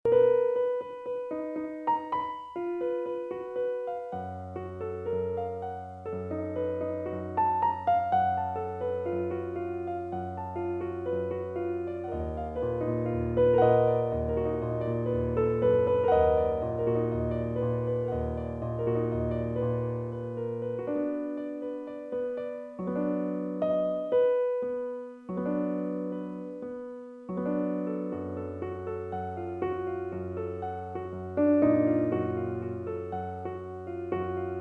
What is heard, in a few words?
Piano